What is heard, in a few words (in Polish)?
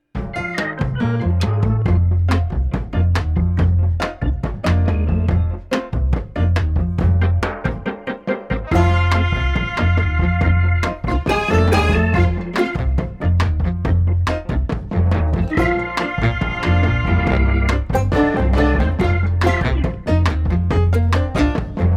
plas bez wokalu